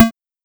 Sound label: Tom Low, Casio Sk-1 Drums